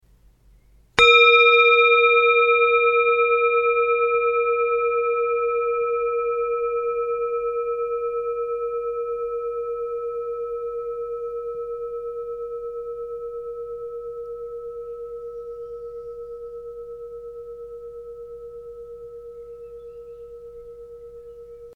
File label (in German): Klangschale